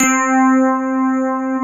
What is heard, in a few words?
FM ELP 8 C3 R, Keyboards Old School; USB Soundscan vol.09; AKAI